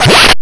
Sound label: camera